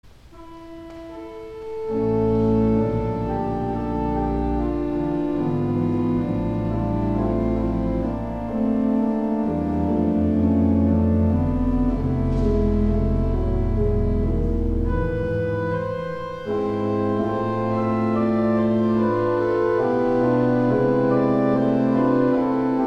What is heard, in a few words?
Organ, Chorale Prelude; Johannes Brahms; 'O Sacred Head, Sore Wounded'; St. John's Cathedral, Jacksonville Florida